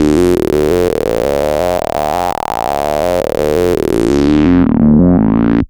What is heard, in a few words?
Pure Analog, Keyboards